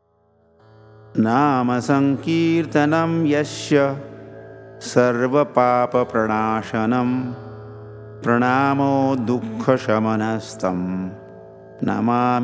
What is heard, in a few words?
Shloka tuned